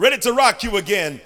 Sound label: VOX GENERAL; Megabass Remix! 2